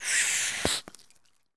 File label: Bubblegum Blow; SFX Kit; Foley - Misc